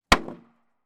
impact wooden planks x2 heavy drop on each other bang